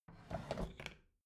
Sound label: bathroom drawer open regular